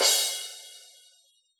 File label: crash cymbal, Yamaha DJX Rock Kit